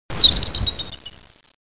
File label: jungle sounds; Puffer Popper